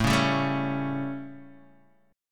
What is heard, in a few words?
A 7th Guitar Standard, chord; strummed; JGuitar